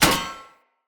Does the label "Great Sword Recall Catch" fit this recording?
Yes